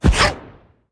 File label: level up; bumbo